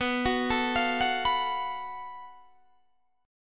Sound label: F sharp Minor Major 7th Double Flat 5th, guitar